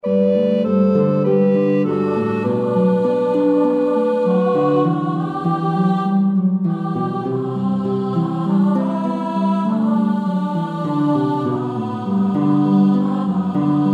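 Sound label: Soprano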